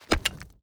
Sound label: click card open